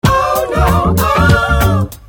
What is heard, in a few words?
ERROR; Desktop Studio 2000; Music